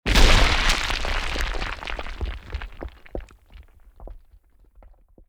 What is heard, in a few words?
GROUND IMPACT Large, Falling Rocks, Various; Articulated Sounds; Magic Elements vol.1